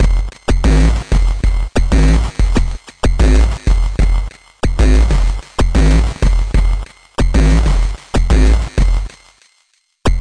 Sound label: 094 mighty crunch bassbreak